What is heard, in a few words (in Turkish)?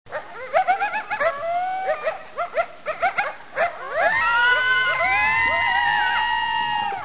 köpekler uluma; hayvanlar